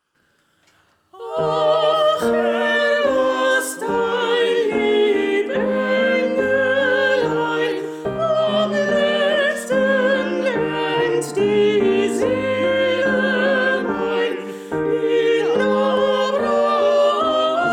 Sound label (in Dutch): sopraan; ach herr